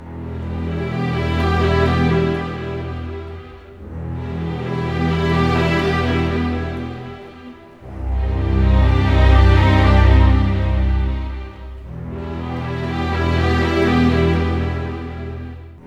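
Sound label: 60 dark, orchestra; East West; Scoring Tools; Dark Side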